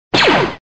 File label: blaster; Star Wars